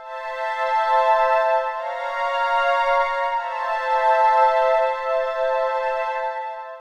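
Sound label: synth electro 140 A; keys; Techno2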